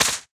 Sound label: Shadow Minion Attack Slash; portal quest android